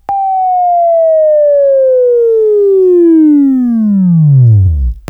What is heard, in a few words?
WAV Left, Sweeping; Sweeping Training program